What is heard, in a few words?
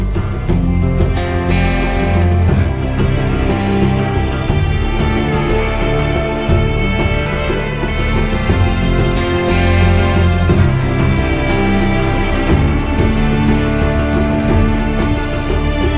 violin; iz igry titanfall